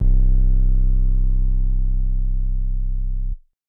808 With Distortion

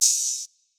Hat Open; Closed Hats

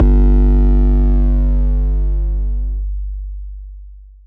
Cruddy Distorted 808

